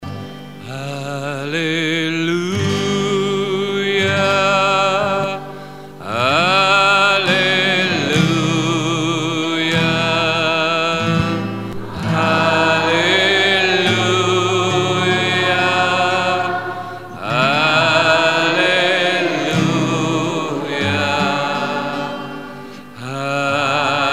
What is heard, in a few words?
aleluja